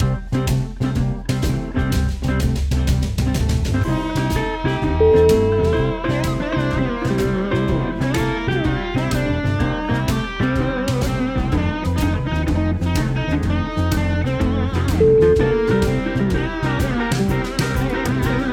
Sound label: country blues attitude